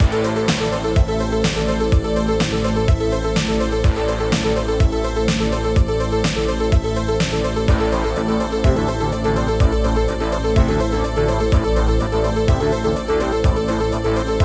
level; prison run and gun

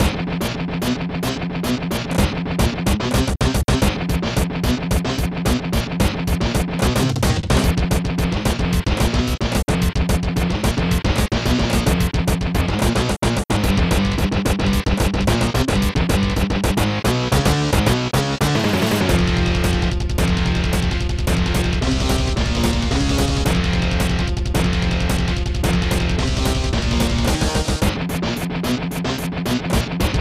Metal; Dragon's Fire

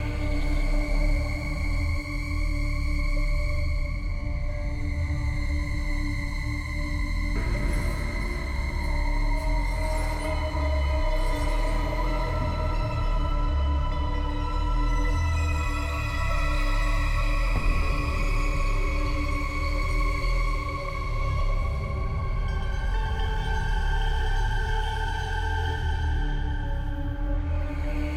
sounds music; Halo Combat Evolved; Xbox

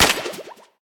Gameplay Wpn Harpoon Shot; Zombie Catchers

